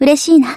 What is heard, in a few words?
win; freak fortress 2